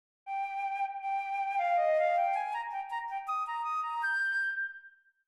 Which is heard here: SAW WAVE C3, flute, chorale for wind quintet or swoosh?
flute